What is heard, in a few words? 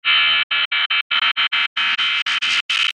Stutter Pan Generator